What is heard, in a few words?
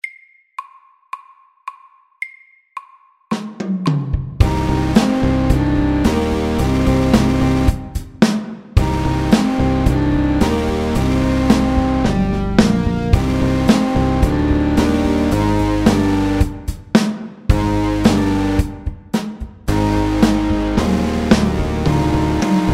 Metal rock MEDIUM 110